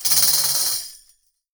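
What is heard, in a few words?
shake, left, Percussion; Miroslav Vitous - Percussion & Harp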